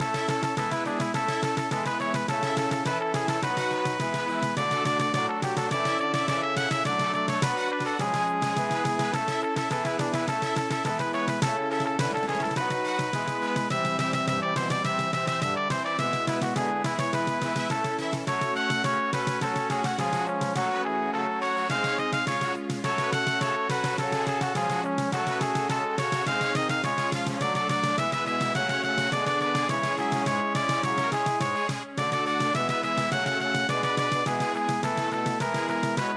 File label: Brass Band March